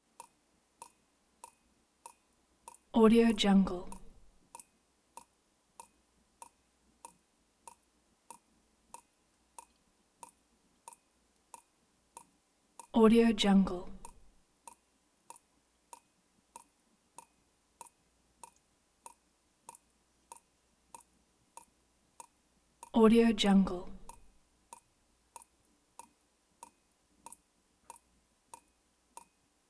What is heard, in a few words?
water drips metallic ring tapping